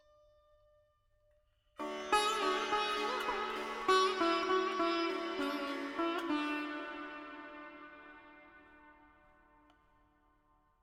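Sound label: Veena, melody, 7-8, D, 100 bpm, FX; Sample Banks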